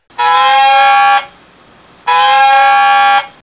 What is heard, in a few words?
dive; Submarine - Diving Alarm - AN/WIC-2 - Unknown 688 or 726 class Submarine